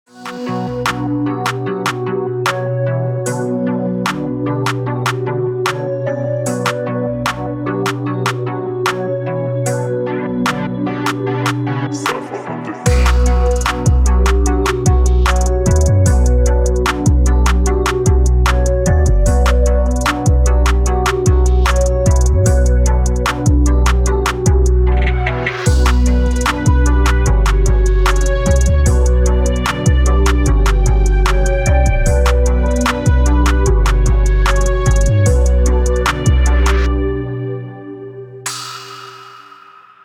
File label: free trap beat